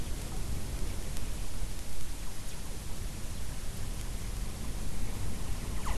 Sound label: WFR audio emfisis